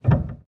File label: piano release